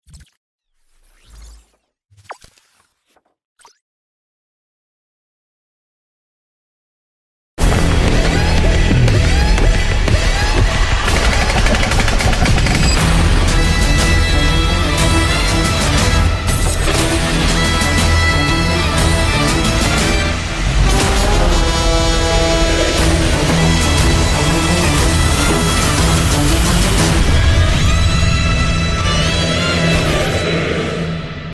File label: mass bgm ringtone; raavan; raavan movie